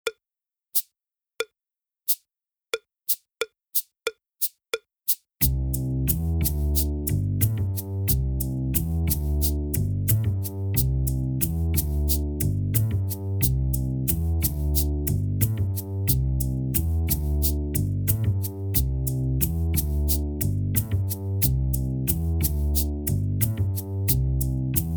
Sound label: Bass Loop